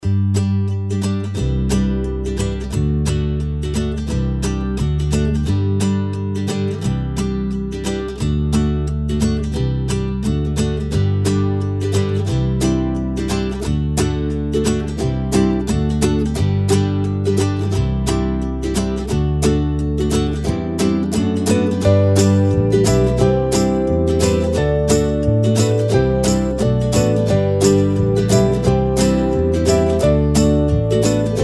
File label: fun guitar and ukulele, Happy Upbeat